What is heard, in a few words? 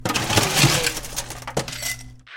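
Ice Dig In Glass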